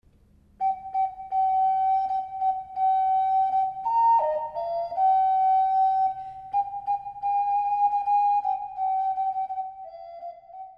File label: Jingle Bells fade, ocarina